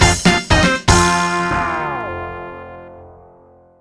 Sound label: game over; tf2ware ultimate; music game; ds diy microgame